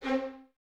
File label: Violins C 4, String Ensembles; Miroslav Vitous; LINKS ORCH